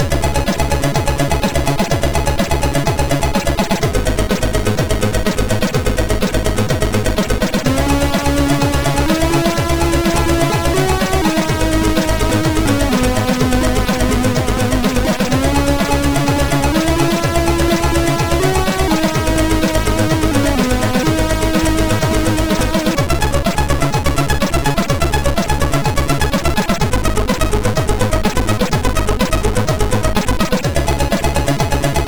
tune sid MOS6581R4; Siebold Markus

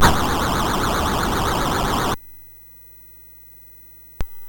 Mini Golf, MOS6581R4

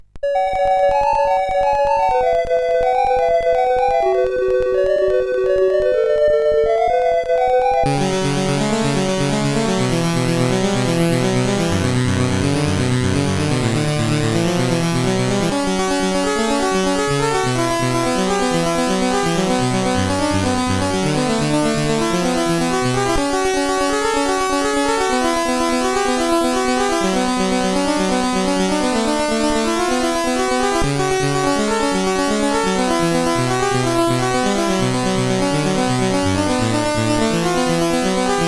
Final Synth, sid CSG8580R5; Warrior

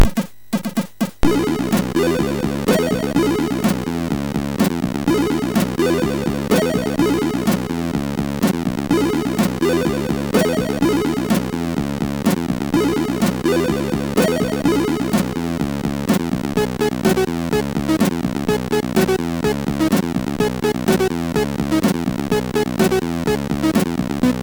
Music, MOS6581R4

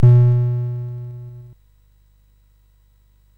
Frantic, CSG8580R5

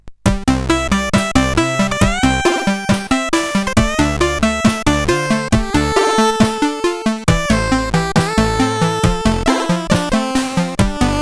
Intro Tune sid CSG8580R5

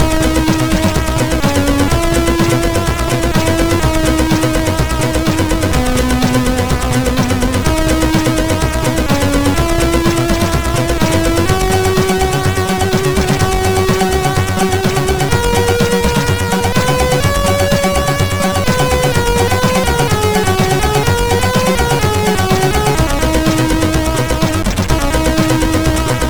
sid MOS6581

